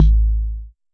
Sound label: Jomox MBrane drum machine; Jomox MBrane 11